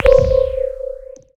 combat drone texture beacon; BluezoneCorp

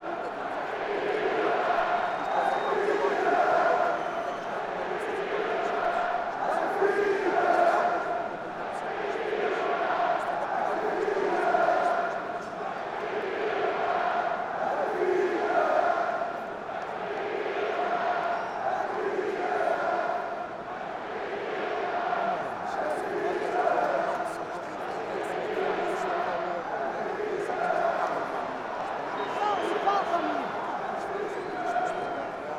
Crowd Chanting, Soccer Stadium Ambience; Sonic Bat

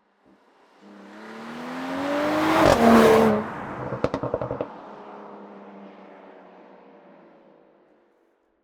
Car Pass Bys Moved Mic, Mercedes E53 AMG; SoundBits

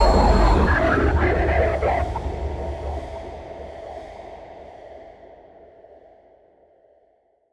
Eerie ambience; Bluezone; Eerie Ambiences and Sound Effects

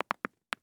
Crepitus, knuckles, hand, multiple, dense, deep; Eiravaein Works

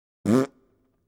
Raspberry Short; BBC Sound Effects Library

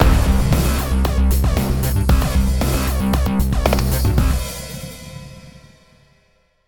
Signature Sound; FXHome; Signature Tracks

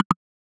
switch, Interface Sounds; Kenney's Sound Pack

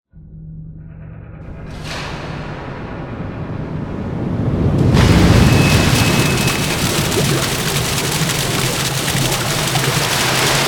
Sewer Cascade, Underground Atmospheres; Olivier Girardot

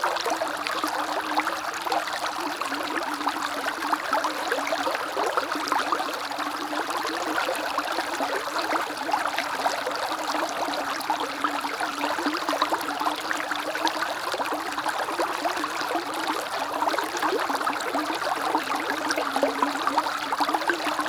Bubbling Creek - Loop; The Sound Pack Tree; Sonic Seaside

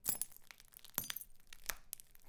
Glass Scrunch; FXHome

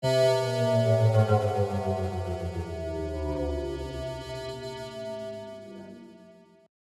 Movement; 99Sounds; InterSpace

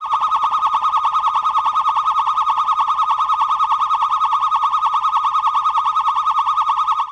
Feed rotated, Feedback FX; 99Sounds; Dark Engine

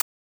Perc, Data Mosh Hits; Other Perc; 99Sounds; Mangling Audio

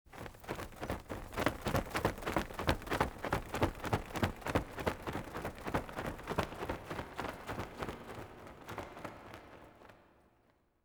Legends, Winged Monster Flies Awa; BBC Sound Effects Library

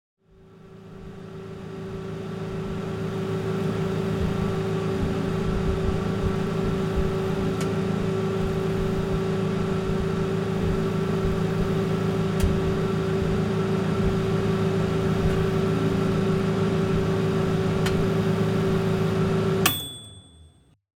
Microwave Oven in Operaton; Kitchen; Sound Ex Machina; Kitchen Sounds

